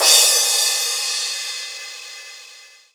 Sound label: XV Crash, Roland XV-5080